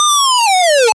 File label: claiming a coin